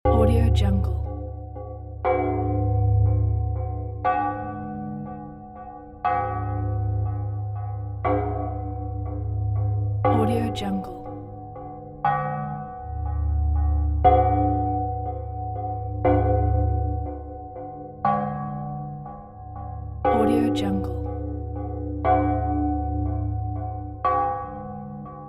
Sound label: scary soundscapes